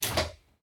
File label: Clink Chunk Mechanical